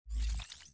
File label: digital whoosh